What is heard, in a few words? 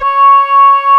C#, Synclav FM, WIND; Big Fish Audio